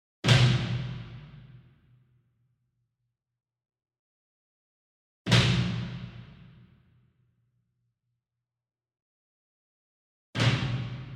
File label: Bass Drum Marching Flam Hard Beater Far; SINGLE DRUM; boom library; Cinematic Strikes Construction Kit